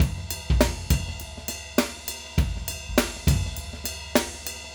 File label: Acoustic Live Drum; Platinum Audiolab